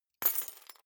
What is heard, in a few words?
key ring large drop parquet soft; objekte; schluessel